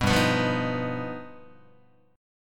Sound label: G Augmented 7th, Guitar; G+7 strummed; JGuitar